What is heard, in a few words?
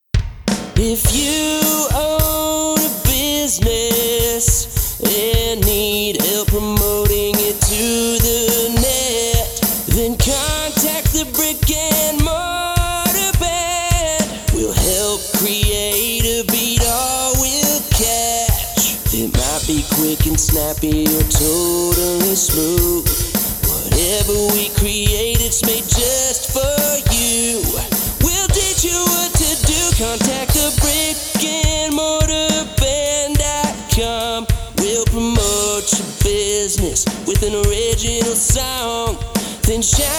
vocals lead